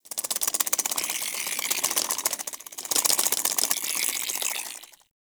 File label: Companion Foley Shake Long, Pets; Warframe; Characters; Zanuka